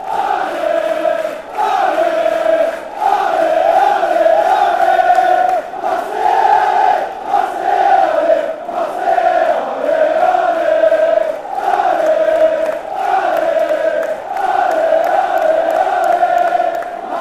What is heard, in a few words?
allez allez om, fan chants